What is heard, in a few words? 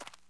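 walk left, dirt FOOT; The Elder Scrolls III - Morrowind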